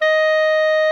CLARINET F5, REEDS; Greytsounds VOL-1

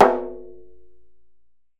DJEMBE SNAP

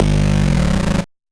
Noise A, 8-bit, Bitty Noises

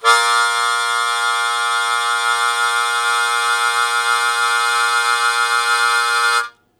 Blues Chord Attk Bb1, Harmonica; Blues Chord Attack; Zero G - Harmonica

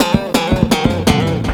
SLAP BRK BSS; Big Beat Samples & More

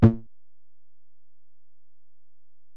Bell, Alesis DM-PRO

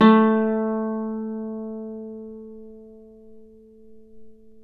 Celtic Harp, Plucked Strings

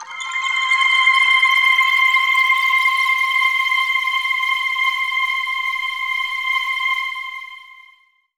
Solar System C, Pads; Jungle Warfare 1&2

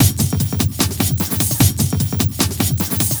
Beat, Industrial

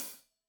Hat Loose, Hi-Hat, Cymbals Stereo

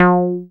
Bass SH-101

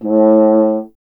BRS F. Horn, Solo Instruments; Miroslav Vitous; Solo Instruments VOL-2; Solo lnst. II

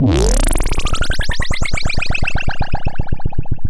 bubble fx

